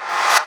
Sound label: Reverse FX